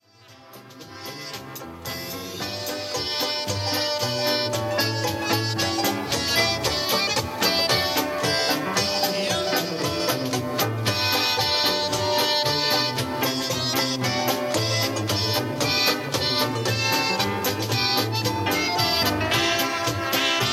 Jet Polka Cuckoo Waltz

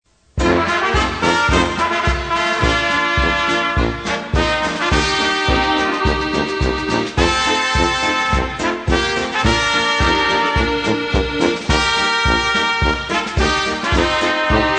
POLKA

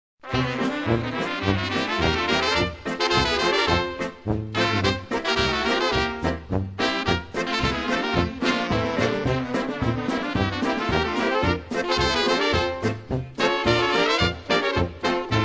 POLKAS

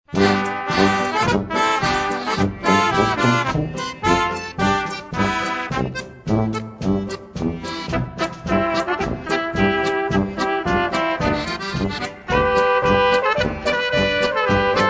POLKA

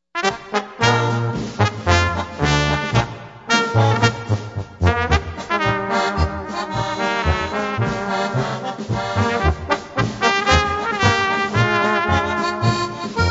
POLKA

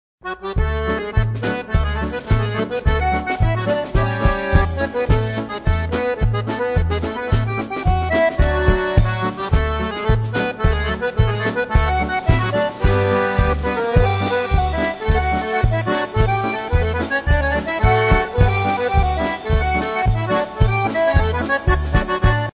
concertina

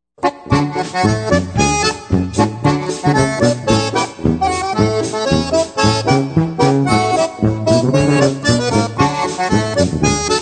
polka

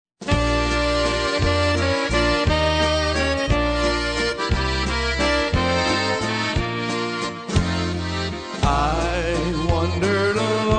waltz

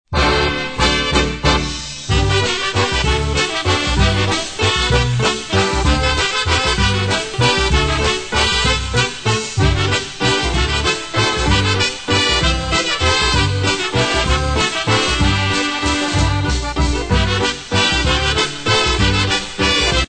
oberek

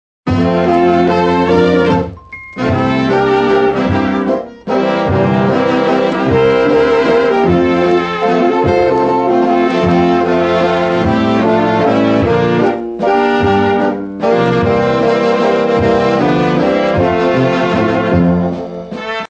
waltz

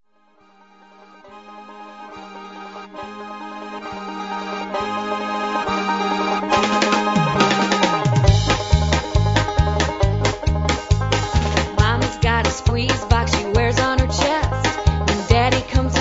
SQUEEZE BOX

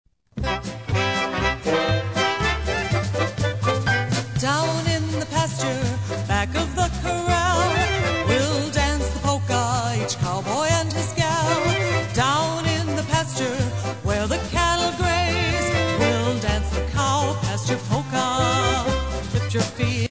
polka

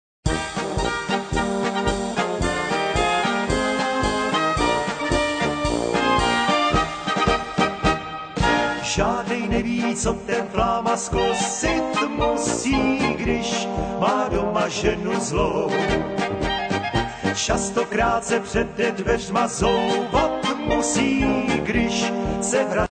POLKA

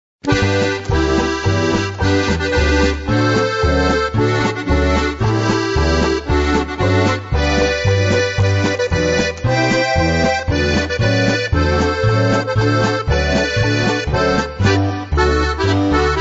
polka

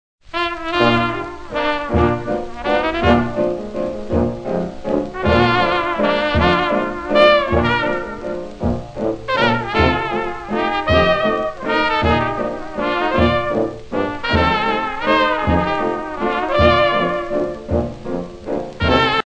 LAENDLER; recorded 1945-1946

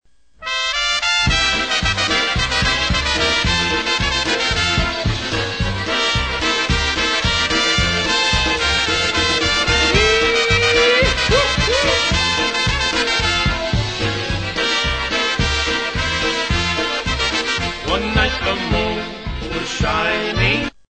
POLKA

